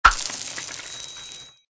Marbles deploy

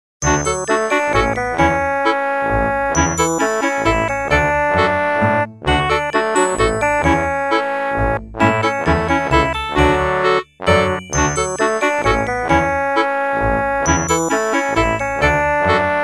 playground; Melodyland

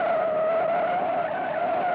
Kart Skidding On Asphalt

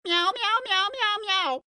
cat long

